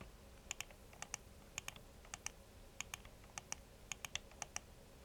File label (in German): Seitentasten